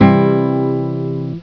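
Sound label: Acoustic Guitar major; Instrmnt; Ac Gtrmj; Sound Library 2000; Wayzata Technology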